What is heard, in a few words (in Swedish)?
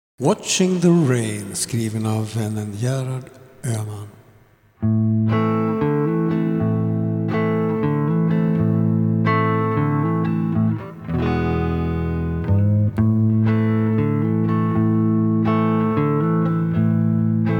Instrumental Sommarvisa